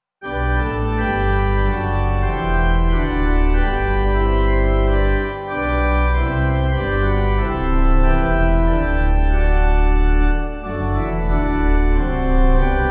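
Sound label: Pipe Organ; Jerusalem My Happy Home; Diana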